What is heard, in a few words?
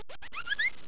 whoopie; 12000 Graphics Pack - A Complete Graphics Collection For Your PC